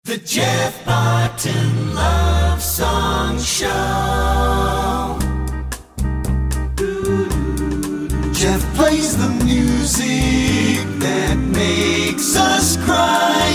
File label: Jinglebells